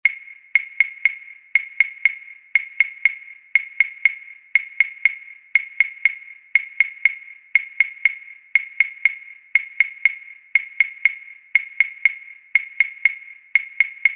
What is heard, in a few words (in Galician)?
Claves